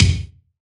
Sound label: BD, BASS DRUMS; EdgeSounds